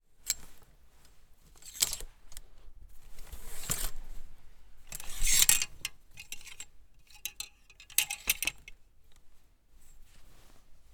Looking Through Clothes On Hangers

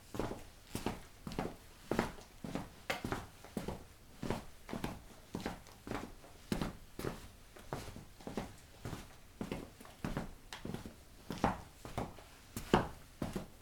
Footsteps On Tiles 3m Away Faint Steps

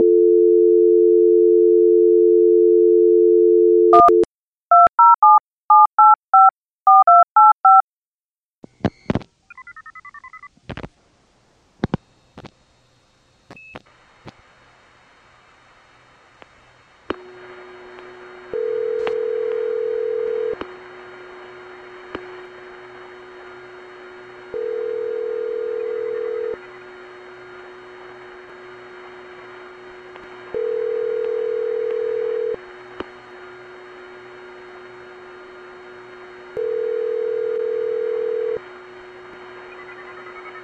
Phone

